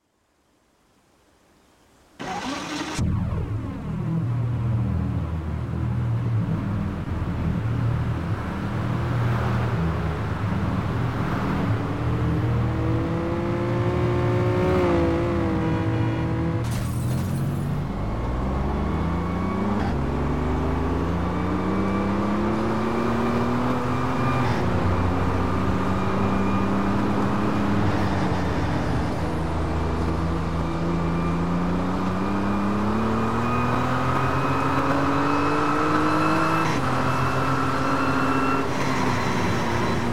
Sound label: ferrari f50; TDU